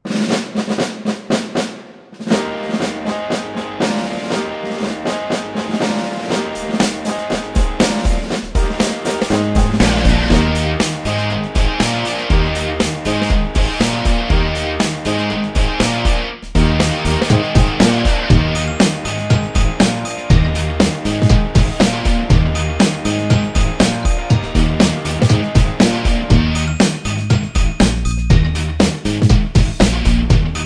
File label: Menu Loop; guns n glory wwii android game music